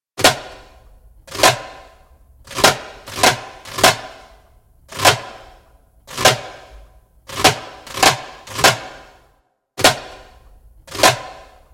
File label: Clean Isolations slow, Djembolale; Djembolale - Fills and Drills